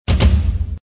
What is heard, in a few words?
sound water line; watermargin